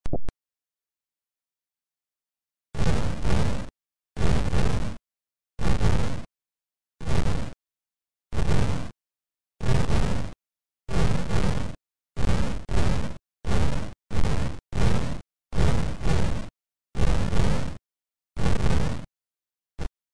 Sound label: ball bounce